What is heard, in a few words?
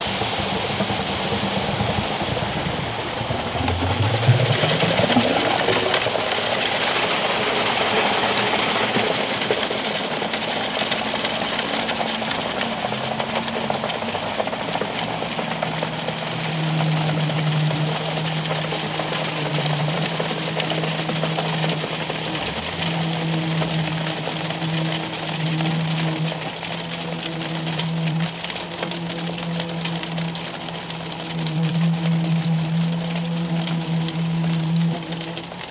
Cement Pouring Down